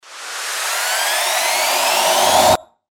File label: RISER